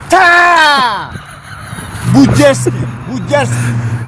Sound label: kill knife; cstrike; ctf